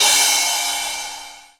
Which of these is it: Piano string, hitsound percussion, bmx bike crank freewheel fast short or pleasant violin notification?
hitsound percussion